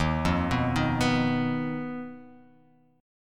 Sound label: D sharp Suspended 2nd Sharp 5th D sharp